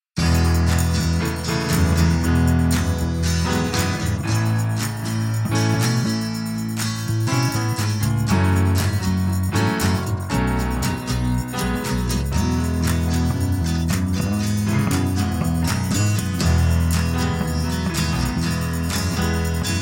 Piano Bar

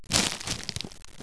player duct

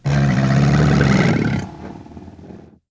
pain; sven; zombie

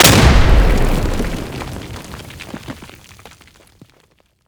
det, wpn gren

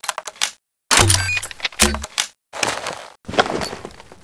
nailgun reload

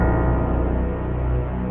suspence, music

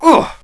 male pain small; jcdenton

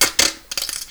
fall

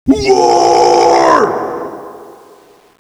waaar

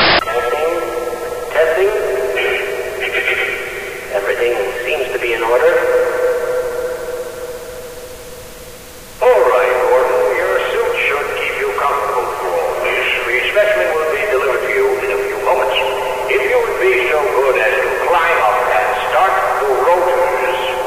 resonance; sven; platoon; dialogs

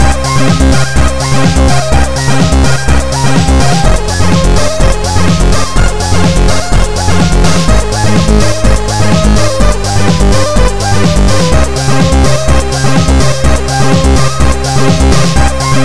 chiptune

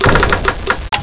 button

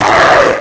attack; perro

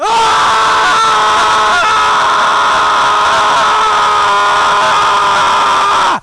scream

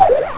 pain

